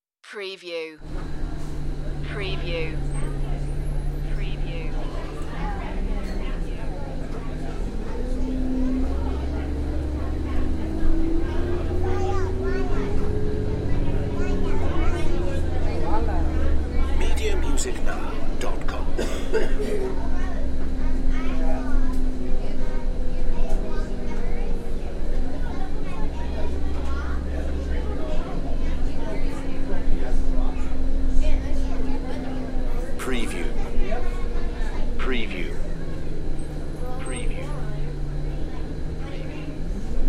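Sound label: Inside Bus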